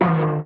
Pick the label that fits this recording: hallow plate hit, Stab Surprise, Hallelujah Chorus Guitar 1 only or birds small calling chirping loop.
hallow plate hit